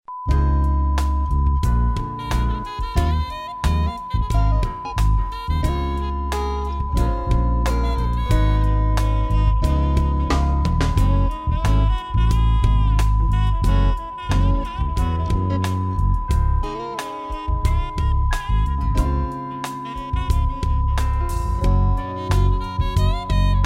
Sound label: cheerful time